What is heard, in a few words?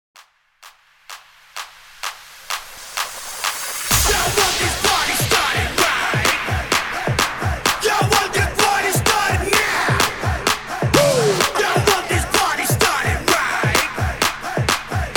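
MAKE SOME NOISE PARTY STARTER 128 BPM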